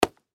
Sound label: Baseball Glove Catch; SoundEffects-Blastwave; Sports